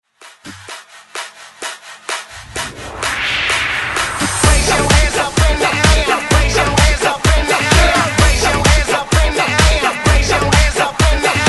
Remix Hype 128 Bpm

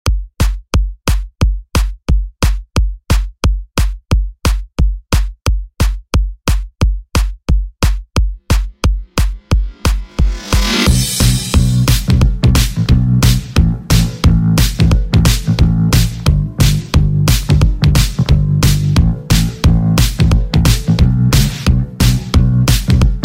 80s Funk Grid Redrum 178Bpm

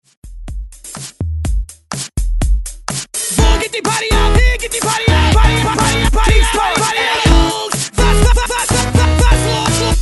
Hype Intro Redrum 125 Bpm; DJDOLLS

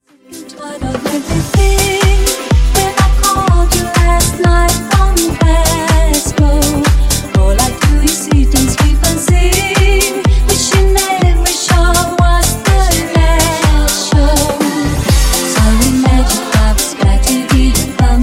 Disko Funk 124Bpm

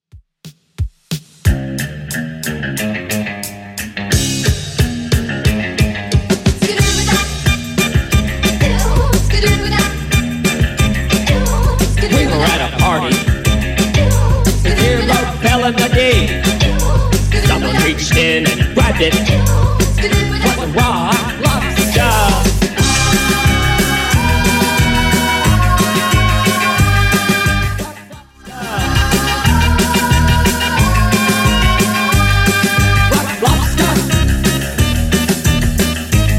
80s 180 bpm

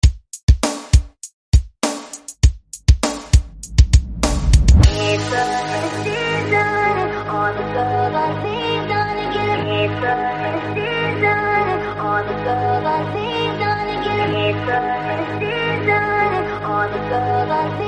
REDRUM HIPHOP, 100 BPM

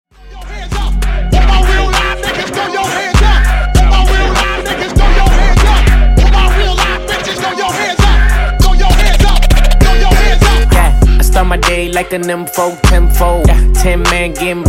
Scratch Hype Intro Outro 99 bpm

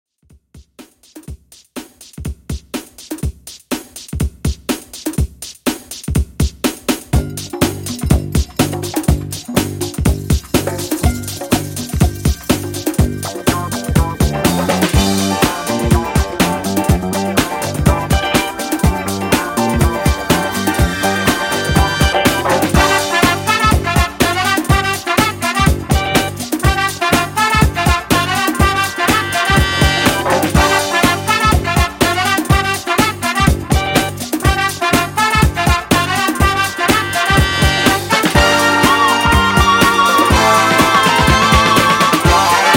70s Disco ReDrum, 123 Bpm

